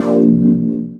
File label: Chords Tones; Equipped Music; Loungin House